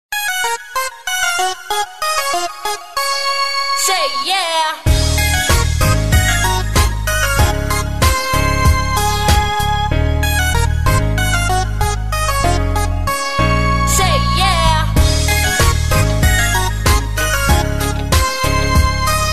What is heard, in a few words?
Nokia Hiphop; Ringtones